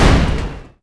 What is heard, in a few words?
Gunship Hurt; Civ4